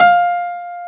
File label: PF, GRAND PIANO; AKAI S6000 CD-ROM - Volume 1